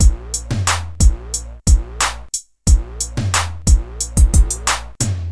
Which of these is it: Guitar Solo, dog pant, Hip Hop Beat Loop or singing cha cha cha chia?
Hip Hop Beat Loop